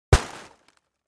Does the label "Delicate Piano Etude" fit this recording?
No